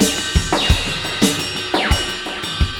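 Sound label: 86 bpm, beat loops; Zero-G; Monster Beats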